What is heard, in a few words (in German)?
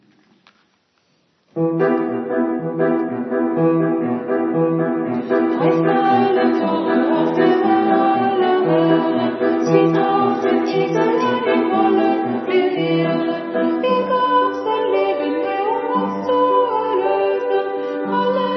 Klavier